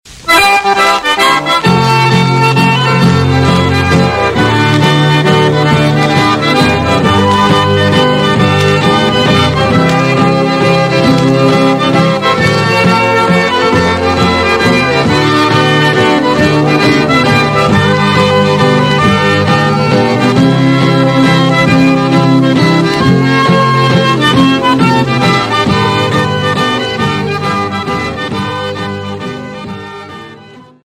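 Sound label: Waltz, Cajun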